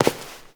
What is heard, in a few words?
Step; Warframe; Koumei